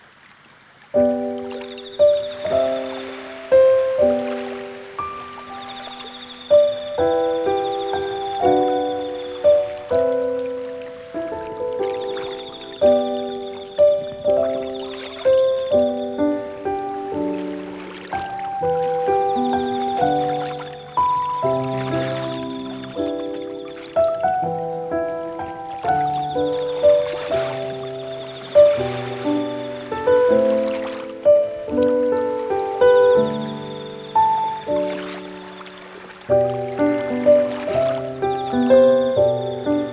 aqua (Ocean Music Box)

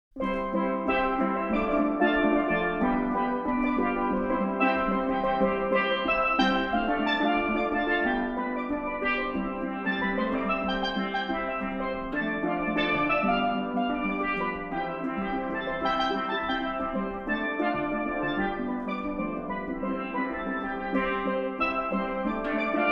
Steel Drum

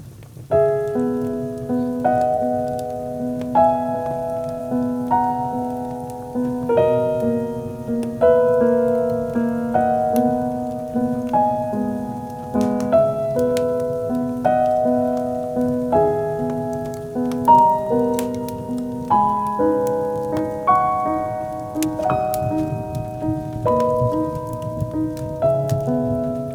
Rain Bonfire Music Box

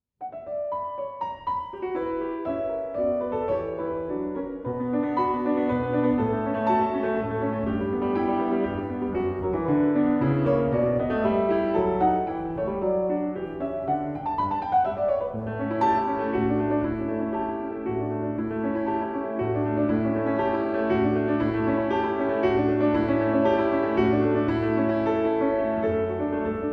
Keyboard Sonata in B Minor

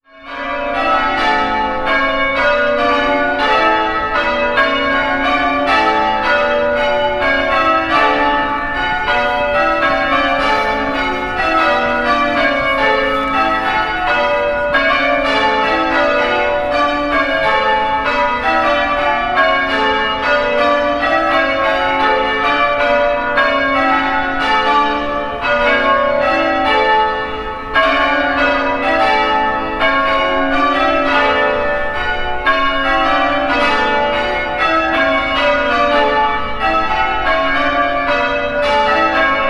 Church Bells in Marsden in UK

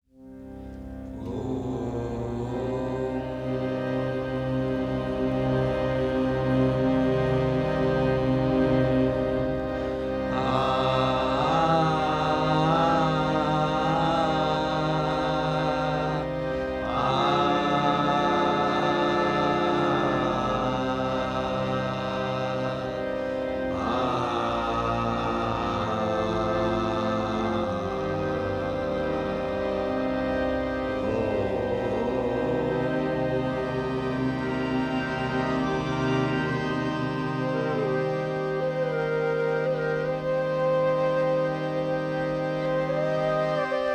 Singing Om

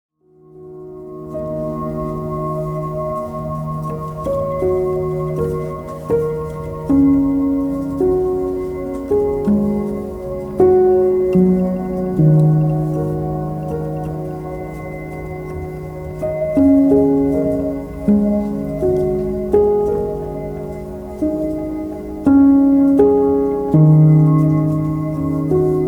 Piano Movement

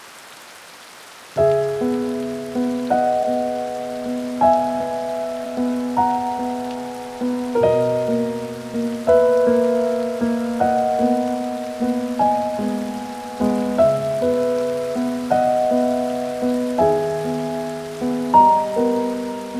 Rain Rain Music Box

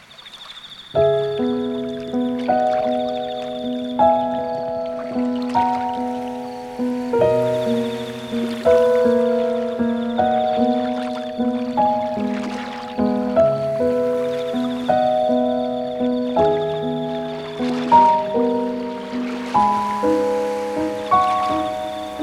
Rain Ocean Music Box

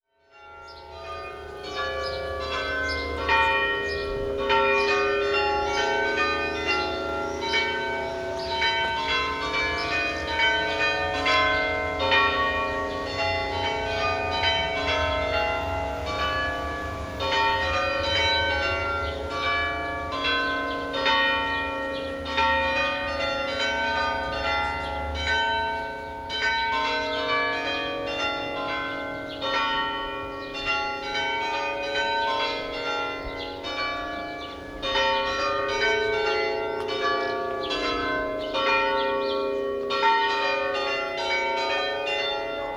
Church Bells in Dent in UK

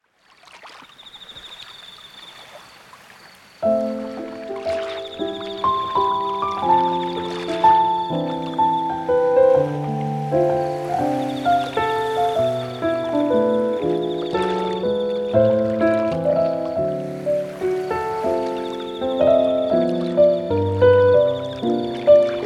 (Ocean Music Box)

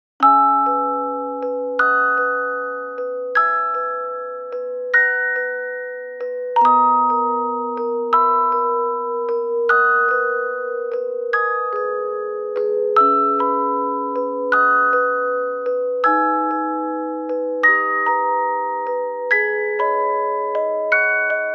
Rain, Music Box

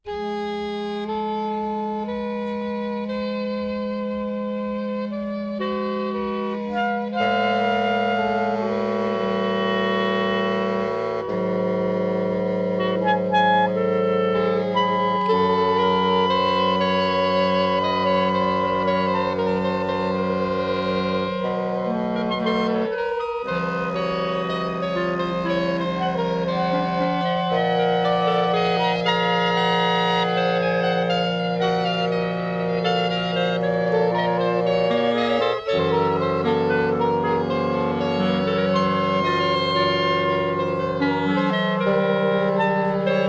¼ Tone Unit

